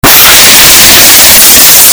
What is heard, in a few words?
loudest sound effect loudest one warning; pranks